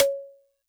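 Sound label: Xylo Snare DX100 C sharp 7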